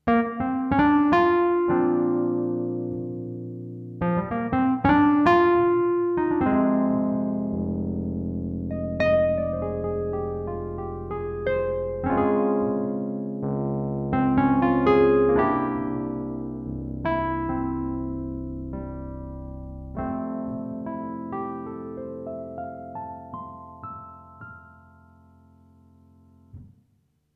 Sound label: E Piano Wurlitzer 200; Studiologic Numa X SE